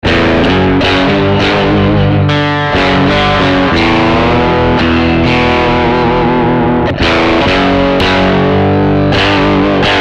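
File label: Medium Overdrive, guitar; Nobels ODR-1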